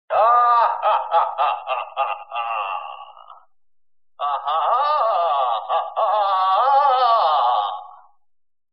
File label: Laugh; DrHorrible